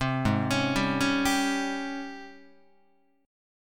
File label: Ab Major 11th Ab Guitar; AbM11 arpeggiated; JGuitar